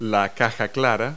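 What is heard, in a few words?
snare drum; Multi-Lingual Talking Picture Dictionary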